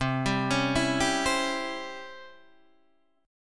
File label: C sharp Minor Major 7th Flat 5th C, chord